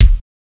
reverb bass drum sound g key

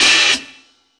dark open high hat sample e key

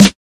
00s short reverb acoustic snare sample g key; snare drum samples

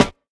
full snare sound d key; snare drum samples

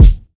original kick drum single hit a sharp key

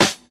loud snare sound f key; snare drum samples

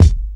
raw kick sound g sharp key

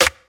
natural clap single hit c key; single hits one shots

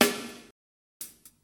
sparkly steel snare drum sample b key

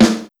snare one shot a key; snare drum samples

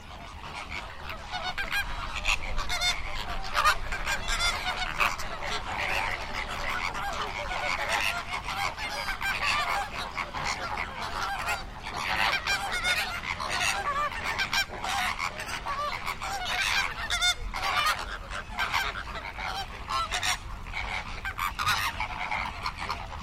flamingo calls; soundscapes

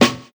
dry steel snare drum b key; snare drum samples

